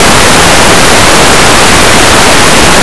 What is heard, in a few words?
cloth; Blue Heat